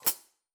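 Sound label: Sab Pedal Hat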